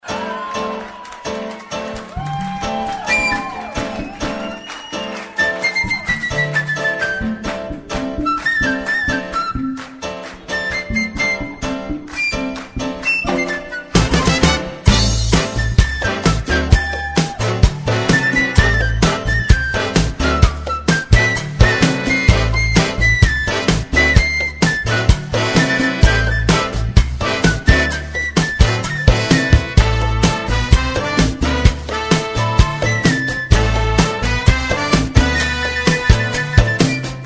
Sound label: Cha Cha Cha